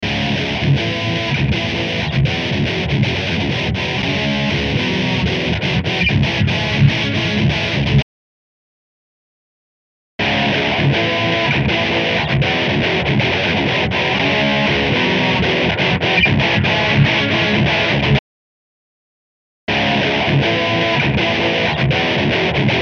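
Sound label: guitar original hi lo cut hi lo cut with drive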